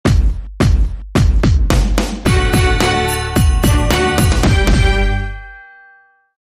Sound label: Sound The Bells, Sting